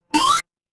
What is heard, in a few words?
retro game combo